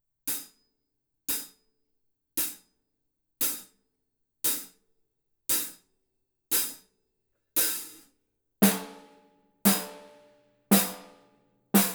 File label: drums